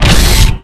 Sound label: Weapons Rocket Launcher